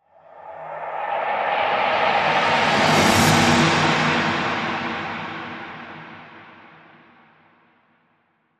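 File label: Reverse Kettle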